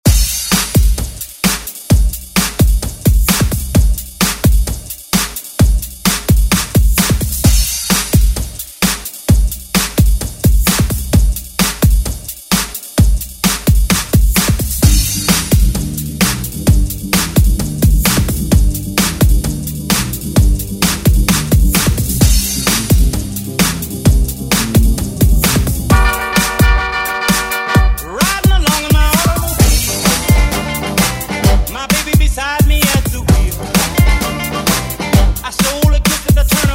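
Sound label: 60 Extended Redrum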